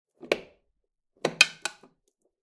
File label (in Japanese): 和風 電気を点ける音; light